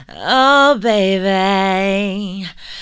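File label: oh baby, female vocals, right; PlatinumPhattCD2; E-MU Producer Series Vol. 8 – Platinum Phatt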